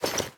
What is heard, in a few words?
equip diamond, armor; Minecraft